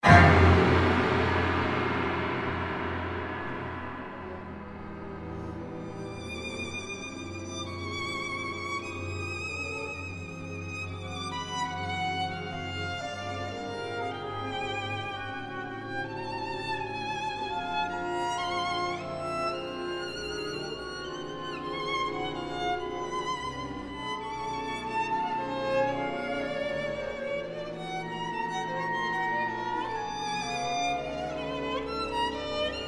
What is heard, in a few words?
VIOLIN CONCERTO